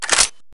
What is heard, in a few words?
slide back, svd